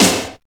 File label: Snare Sample G Key, steel snare drum sample tuned to the G note. Loudest frequency: 3449H